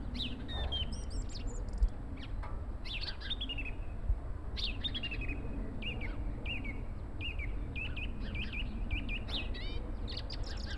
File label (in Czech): ptaci; Mafie 1